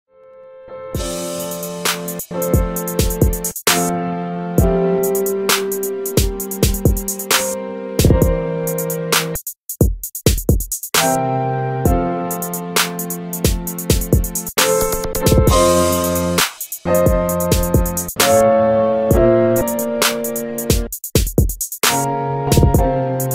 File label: Happy Birthday Instrumental Beat